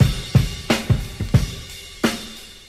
offbeat, Boombap Drum loops

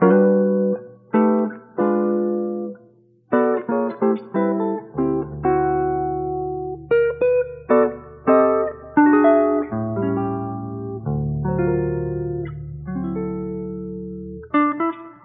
guitar random strums, Loops; Phonky

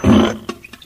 shitting; Farts

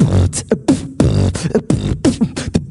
Beatbox

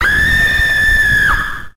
scream

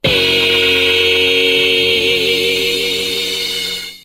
eeeeeee

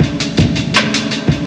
echoey, Boombap drum loops

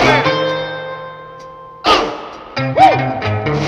guitar woo stinger, loops; Phonky

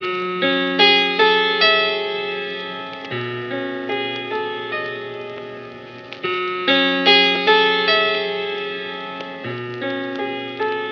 flute pianesque, Loops, Sad

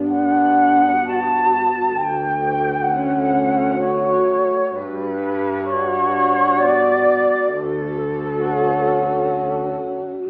strings, Sad

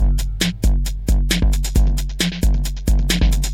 Drum loops Electro

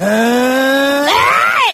heh ay, Voice; ooh ahh; heh huh

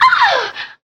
female orgasm

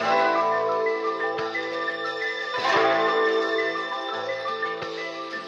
keys

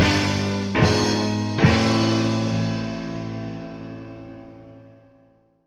guitarness, Stabs

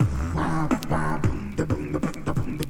Beatbox; Drum loops

